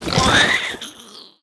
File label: Stone Elemental Death